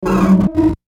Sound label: Musical Descend Buzz Electronic; Musical Sound Design Sound Effects